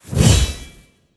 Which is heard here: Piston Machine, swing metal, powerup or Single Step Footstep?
swing metal